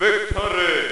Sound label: victory; blockofighter